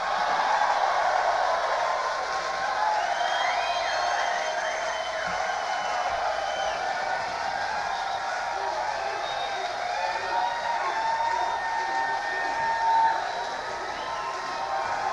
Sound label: Crowd; Portland, OR; Roseland Theatre